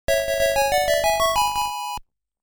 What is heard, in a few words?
Extend Jingle; Aleste Collection music album